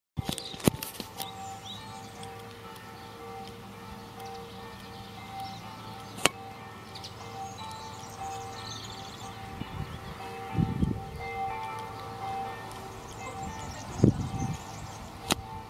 SONNERIE MESSE; GOURGE 79

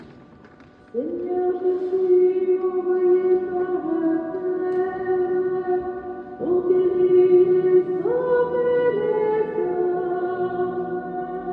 CHANT KYRIE, MESSE; CHEF BOUTONNE 79; EGLISE NOTRE DAME FETE PAROISSIALE

